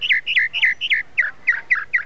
mockingbird

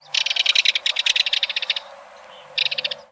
belted kingfisher

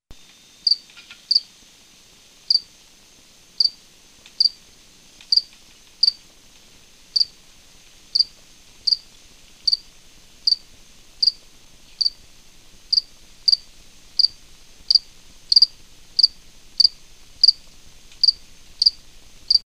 cricket

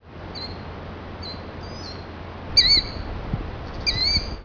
killdeer

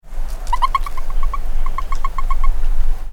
california toad

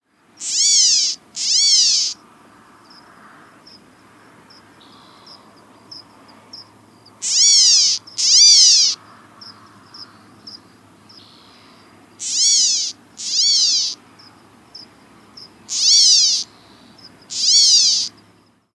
california gnatcatcher doubled mew calls

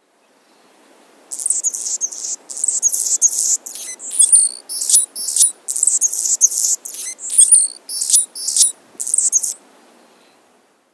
annas hummingbird song

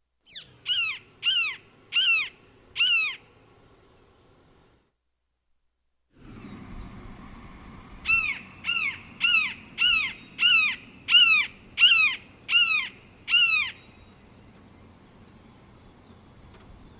red shouldered hawk

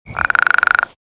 western spadefoot toad

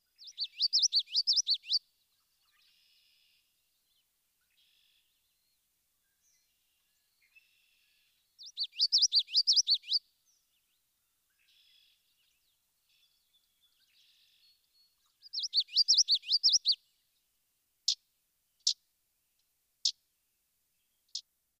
common yellow throat

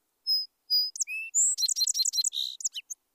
song sparrow

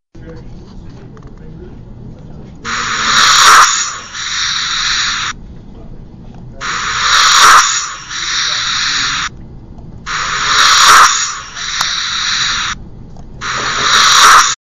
alligator

